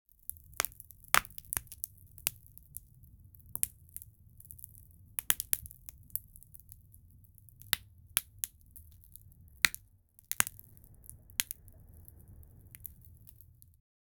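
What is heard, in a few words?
cracking logs in a campfire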